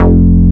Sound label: bass classic F; Polyend; ARC Noise